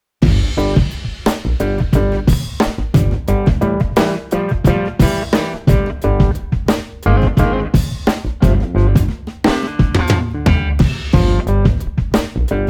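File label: Street Guitar Bass Laidback Cool, 88 bpm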